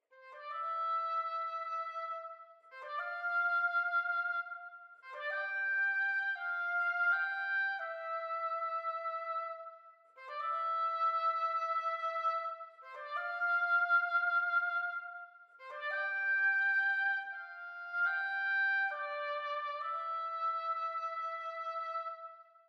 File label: Solo Oboe Classic Mix; Nucleus